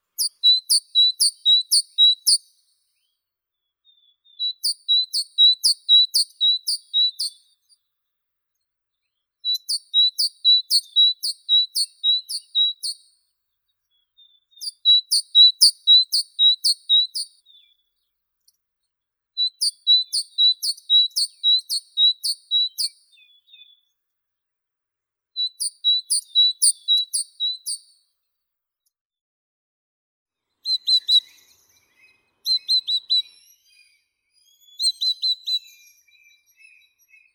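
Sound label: great tit parus major; sinitsa bolshaya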